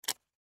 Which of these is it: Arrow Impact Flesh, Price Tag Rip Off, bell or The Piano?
Price Tag Rip Off